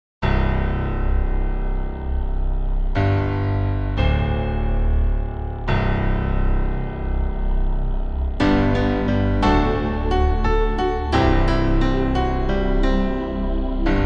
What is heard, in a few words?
Piano